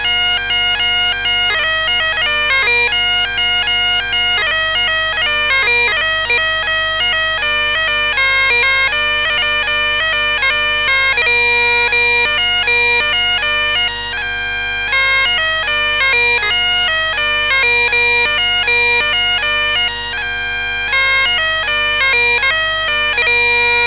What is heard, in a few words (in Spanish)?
Marcha Do Entrelazado De Allariz